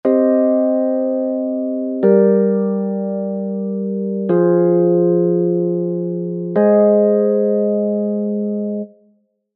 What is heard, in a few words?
Chorus No Color